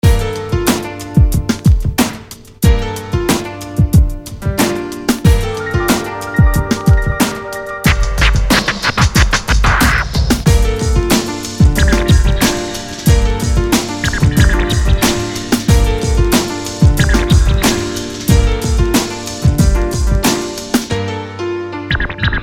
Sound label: Rewind, hip hop r&b